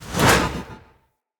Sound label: Shield Throw; Weapons; Swords; Dendrit Sword And Board